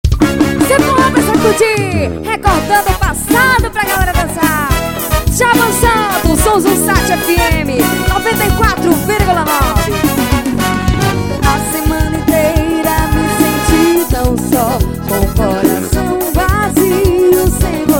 forro pra sacudir antigas

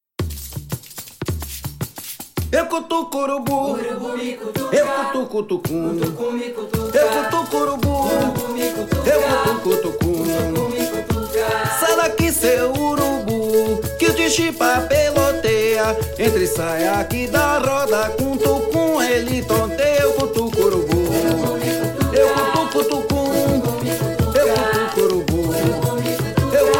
Urubu 2 Percussivo

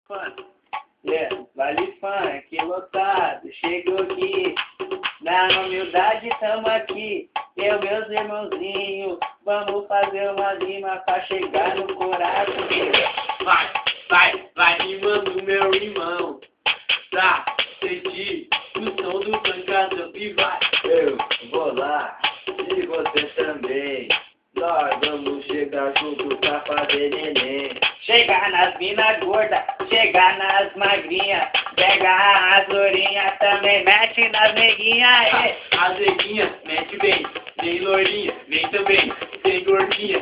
funk do nenem

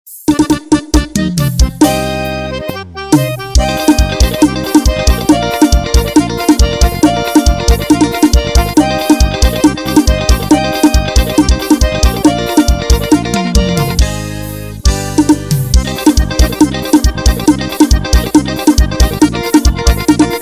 vaneirão playback

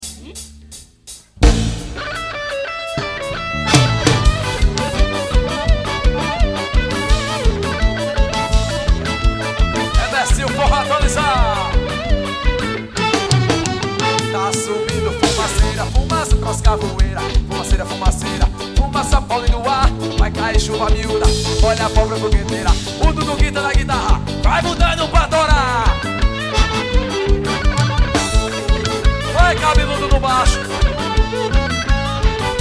Messinho e Forró

